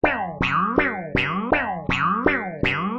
Circus Room Hat Pole

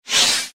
sliding door; Quicksilver

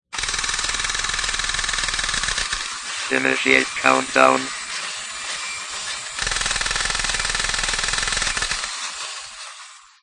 Lab robot; Tootbotics

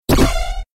self fire; Quicksilver

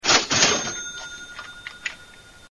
chime

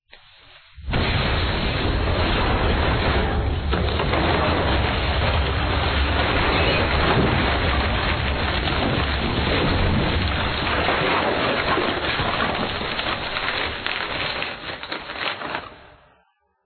comic crumbling

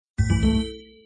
button

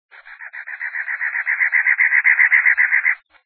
safari birds

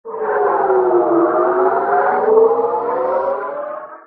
space hover

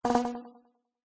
Circus Room Lights; Quicksilver

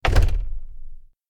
Door Open Wooden

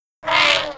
elephant trumpet

